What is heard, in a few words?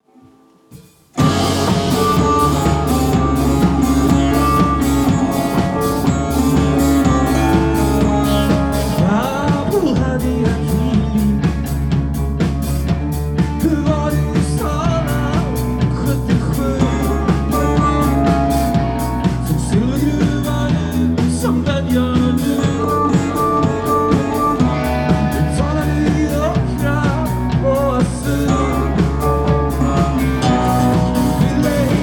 Saz